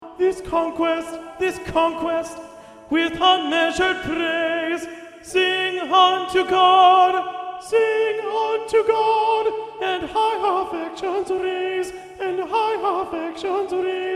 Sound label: alto